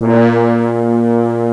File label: fhorn Bb2; Hexen Music; Hexen 6vox